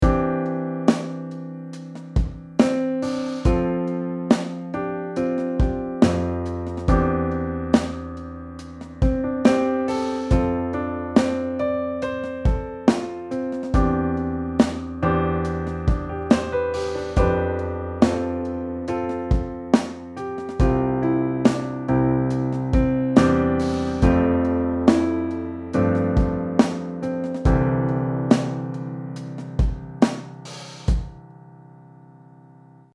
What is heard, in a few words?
Ballad with drums